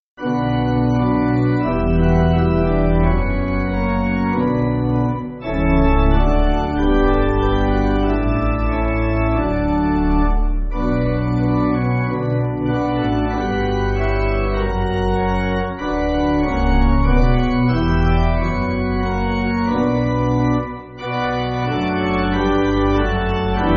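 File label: Pipe Organ, Backing Files for Churches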